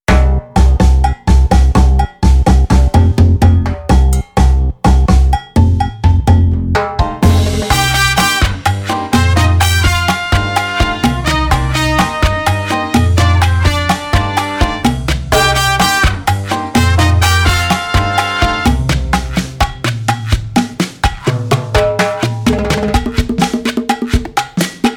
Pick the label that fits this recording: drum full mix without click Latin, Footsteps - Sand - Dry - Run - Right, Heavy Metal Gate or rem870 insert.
drum full mix without click Latin